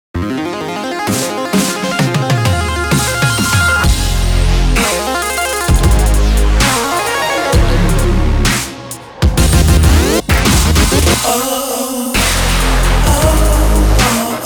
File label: Ring; Lumia Ringtones